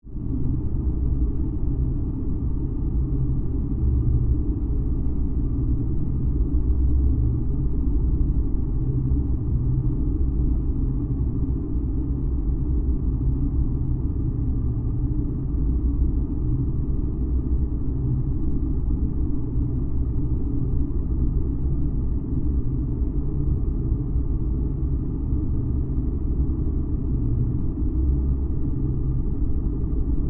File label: Drone Alien Cave, Scifi; Blast Wave